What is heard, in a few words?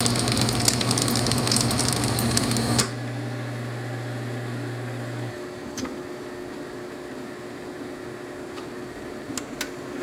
pellet stove fan running and spinning down; fire